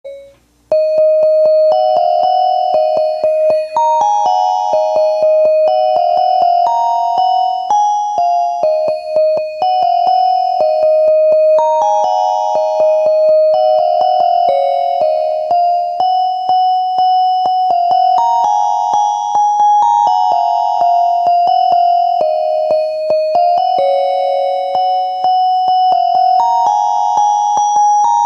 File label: Music Box